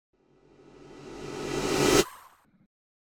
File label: Whoosh Reversed Cymbal Approaching - Medium; Hollywood Edge; sound designer's toolkit v2